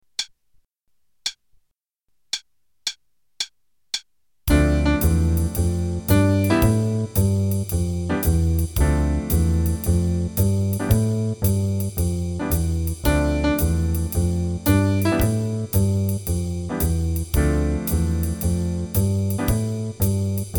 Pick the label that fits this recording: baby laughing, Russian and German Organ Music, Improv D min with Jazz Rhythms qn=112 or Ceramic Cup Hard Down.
Improv D min with Jazz Rhythms qn=112